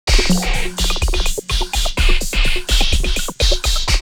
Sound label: Electronic 126, drumloops, Rave; X-Static Goldmine; 90 s Sample CDs